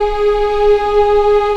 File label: ANGELIC G#3